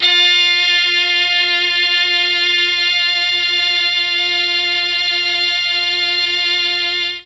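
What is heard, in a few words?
F3 strings brass, Mellotron; Mike Pinder - Mellotron Archive CD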